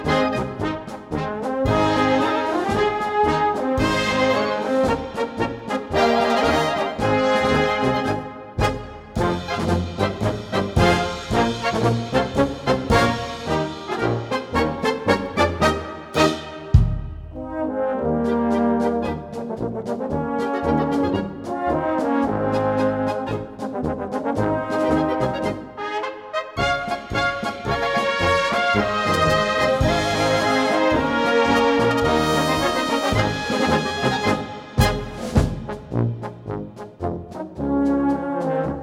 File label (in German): Polka